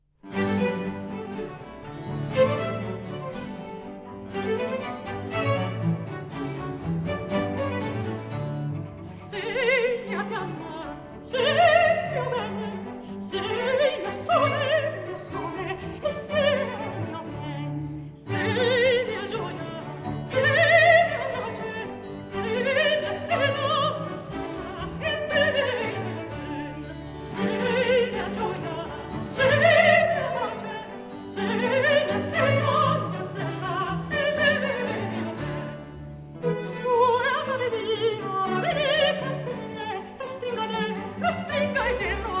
Duetto; Scena 12; Angelica, Medoro; Sei mia fiamma, e sei mio bene; Antonio Vivaldi (1678 - 1741); Vivaldi - Orlando furioso (2004); Atto II

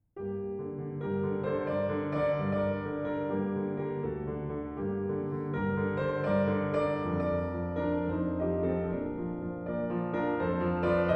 Piano Sonata G Andante; No. 25; Op. 79; Beethoven

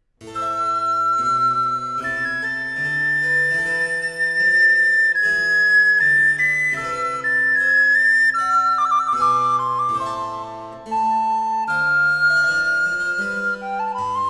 Flute Sonata In C, Adagio; Bach; BWV 1033

